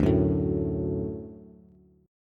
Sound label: D Major D down Bass; D strummed; JGuitar